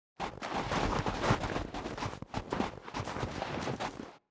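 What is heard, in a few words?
snow crowd march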